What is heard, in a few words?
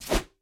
Obstacle Fruit tree Disappear; In Obstacle Fruittree Disappear